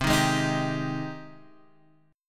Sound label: C Minor Add Flat 6th C; maddb6:1,b3,(5),b6; Cmaddb6 Chord {8 6 6 8 8 X} strummed